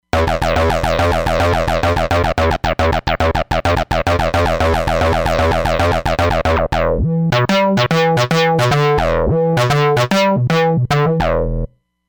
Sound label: Lo-Fi TB; Roland Fantom XR